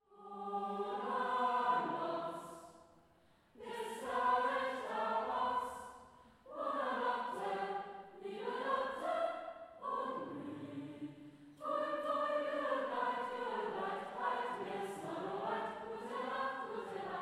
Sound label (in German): Chor Bona nox; W. A. Mozart; Musikdarbietungen bei der Kunstnacht